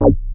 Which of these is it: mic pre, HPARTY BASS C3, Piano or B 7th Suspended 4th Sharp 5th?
HPARTY BASS C3